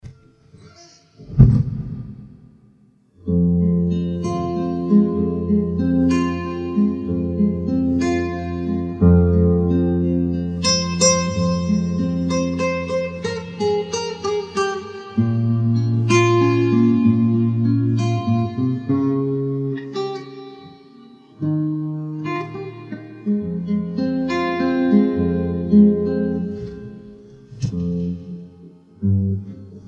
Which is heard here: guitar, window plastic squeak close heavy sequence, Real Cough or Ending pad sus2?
guitar